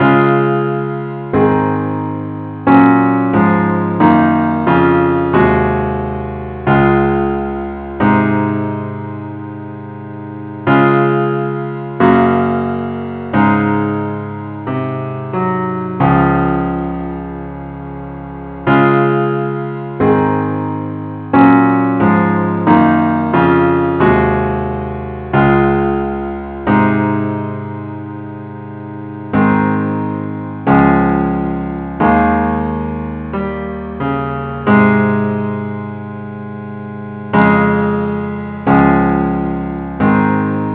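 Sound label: parts only key c sharp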